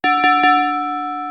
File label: warning